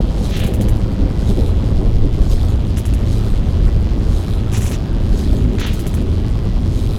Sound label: Lightning Rail Loop